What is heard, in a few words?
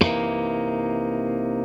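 funk chord, Funk Gtr & Wah; PlatinumPhattCD2; E-MU Producer Series Vol. 8 – Platinum Phatt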